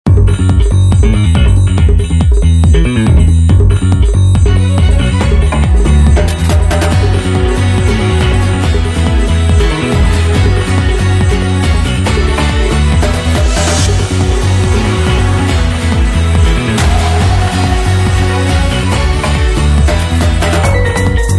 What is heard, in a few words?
Battle BGM